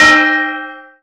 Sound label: Gong; HeavenStudioPlus; working Dough